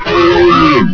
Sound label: alert; svencoop; cryocell